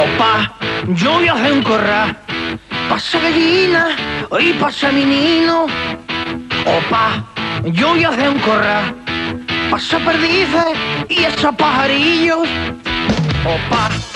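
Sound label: Spanish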